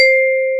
C 4, PERC; AKAI S-Series CD-ROM Sound Library VOL-8

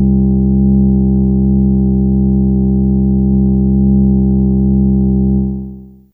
Vox C2, Humans; Fairlight CMI IIe

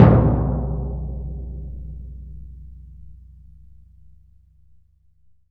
TMP C#, Synclavier Percussion

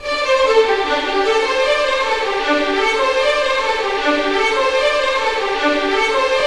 RIVER; East West; Scoring Tools; ORC; River Flow

